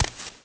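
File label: walk left, FOOT; The Elder Scrolls III Morrowind GOTY; XBOX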